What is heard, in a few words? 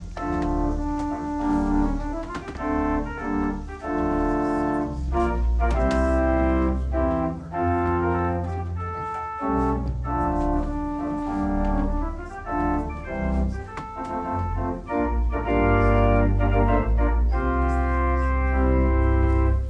Prelude organ and trumpet